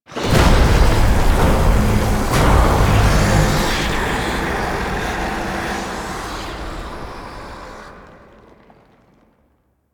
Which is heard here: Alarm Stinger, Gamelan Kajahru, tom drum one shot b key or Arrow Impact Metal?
Alarm Stinger